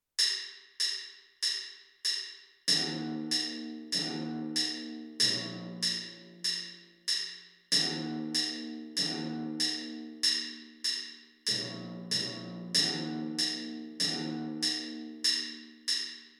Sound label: timpani